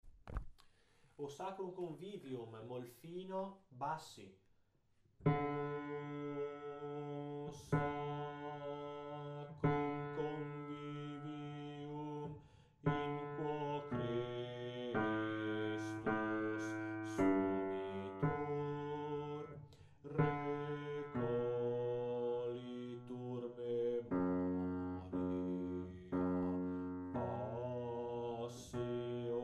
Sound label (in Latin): o sacrum convivium bassi